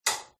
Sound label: switch off